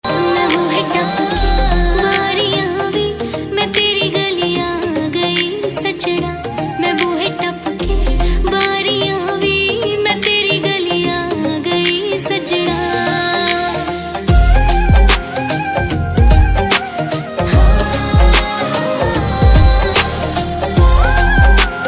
Punjabi Ringtone Punjabi song ringtone Love ringtone